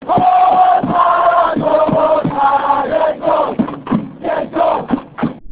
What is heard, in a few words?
call